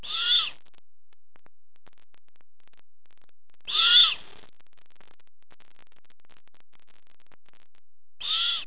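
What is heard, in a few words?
Great Horned begging, owl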